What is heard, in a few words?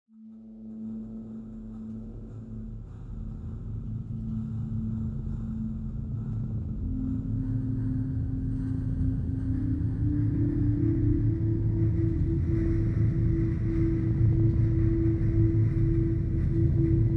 72bpm